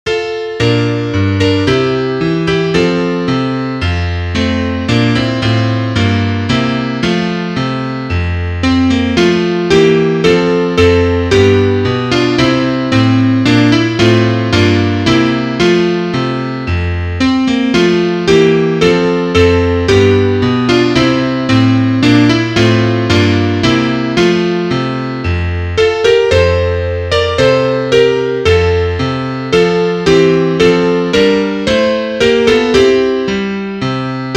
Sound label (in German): Klavier; nun will der Lenz